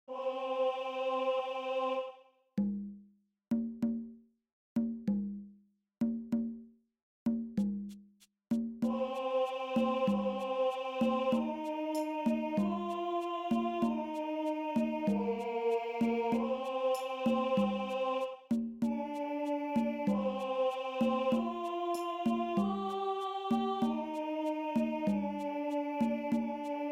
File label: The Sacred Circle ALTO, choir